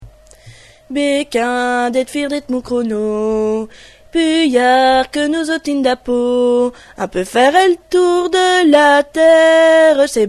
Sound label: mouscron noise; partitions